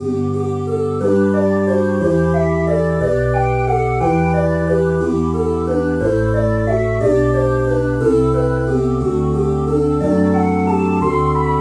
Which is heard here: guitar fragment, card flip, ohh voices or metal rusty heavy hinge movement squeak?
ohh voices